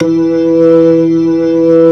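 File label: B3 distortion, E3; VINT.KEYS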